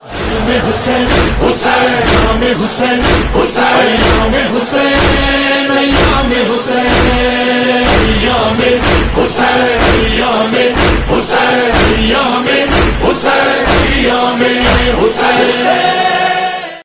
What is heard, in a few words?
Noha Ringtone